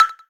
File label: ball Bounce; HeavenStudio; bouncy Road